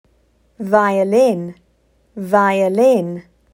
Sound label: Violin